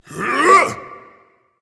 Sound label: grunt attack berserk; cuchulainn